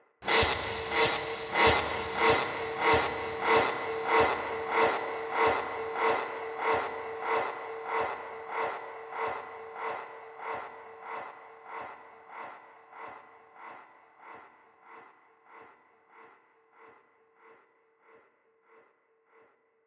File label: Voodoo 142 BPM, Ethno, Psytrance; Ethno Box; Voodoo Gris Gris